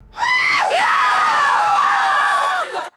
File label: sudden burst of crowd panic screams; crowds